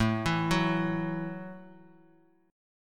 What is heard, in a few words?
A 5th 6th A, guitar chord; A56 Chord {5 7 4 X X X} arpeggiated